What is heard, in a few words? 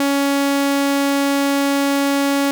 tone